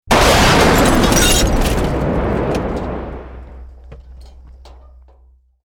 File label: Building Collapse Medium